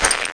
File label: player snow